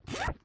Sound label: rift Close; DimDoors